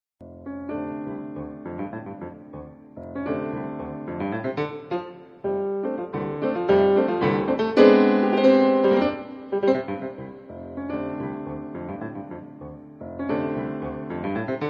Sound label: eccentric, Konzertpianistin; Debussy; General Lavin